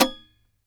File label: SAZ TAP; Spectrasonics; Hans Zimmer Guitars VOL-2